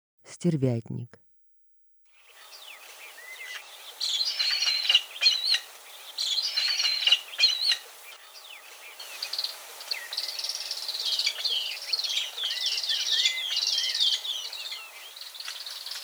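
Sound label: Egyptian vulture voice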